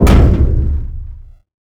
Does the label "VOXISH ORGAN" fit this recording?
No